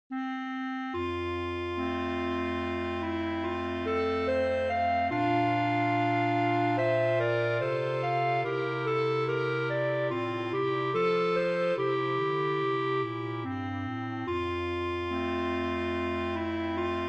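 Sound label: Winds, clarinet quartet; Wedding; Traumerei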